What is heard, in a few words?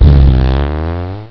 fart